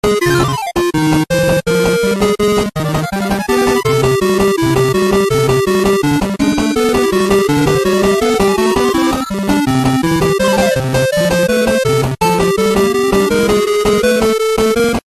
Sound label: 8-bit; Animaniacs